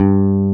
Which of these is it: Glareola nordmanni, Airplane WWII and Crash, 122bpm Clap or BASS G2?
BASS G2